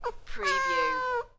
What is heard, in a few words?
Cat Meow